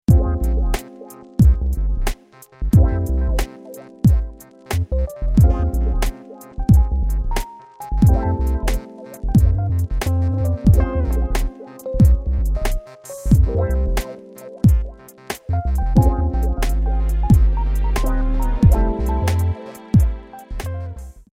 909 smooth

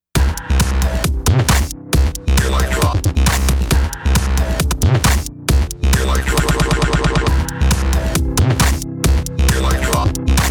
effects djfx looper

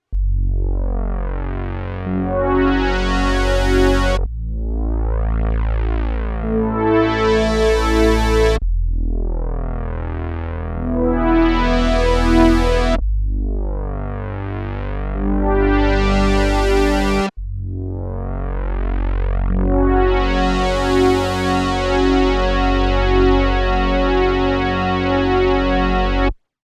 Filter Flow

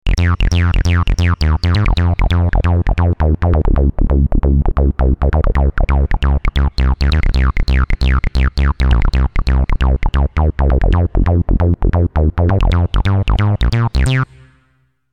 acid bass

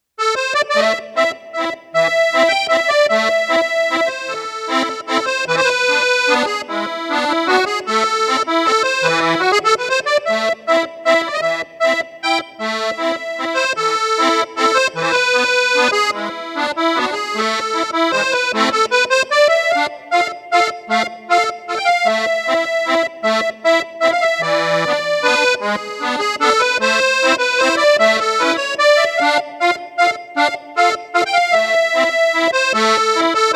accordion

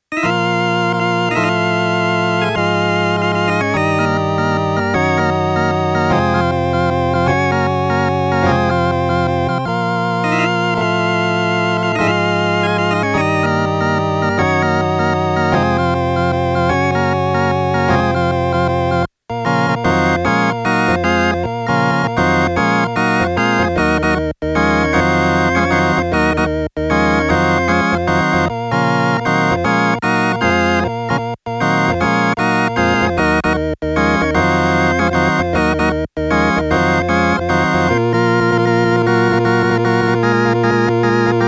vr-09 transistor

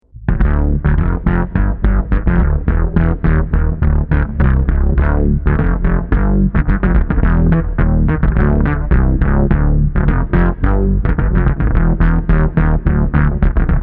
v bass synth bass

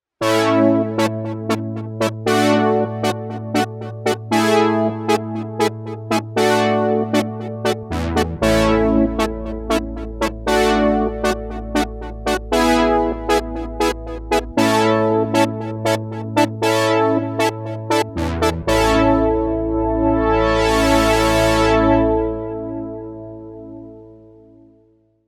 80s JU Brass

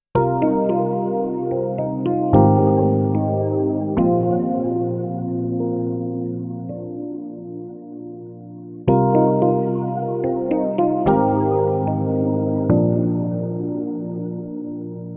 v synth xt trippin ep

